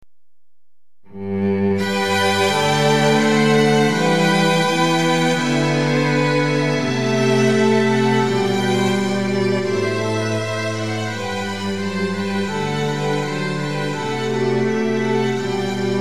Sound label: Sinfonia, Midi, Classic